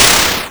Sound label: crash